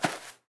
grass step; Golden Crayon Sounds

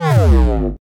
team leave; Original TK Sounds

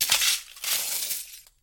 broken glass step; Golden Crayon Sounds

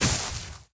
brush step; Golden Crayon Sounds

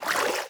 swim step; Golden Crayon Sounds

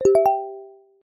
Screen Change; business scheme beta 2

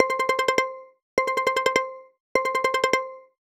local earthquake us; iOS 26 Sounds

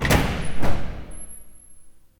door open; Golden Crayon Sounds

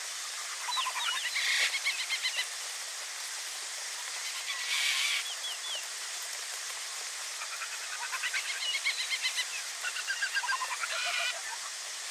birds; Golden Crayon Sounds

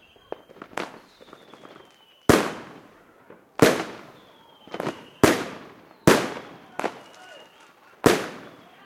firecrackers; Golden Crayon Sounds

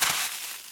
deep sand step; Original TK Sounds

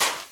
sand step; Original TK Sounds

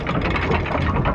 door move; Golden Crayon Sounds

